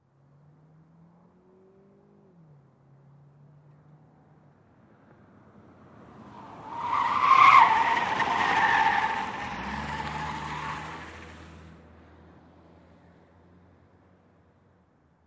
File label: Sedan Accel Corner